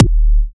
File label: Kick